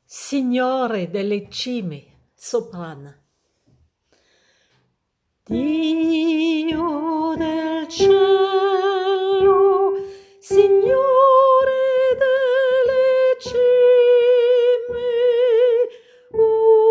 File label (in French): soprane